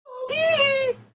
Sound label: teehee